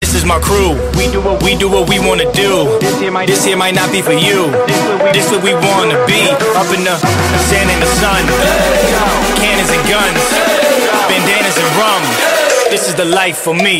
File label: New Hip Hop